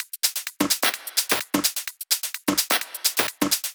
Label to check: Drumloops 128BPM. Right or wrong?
Right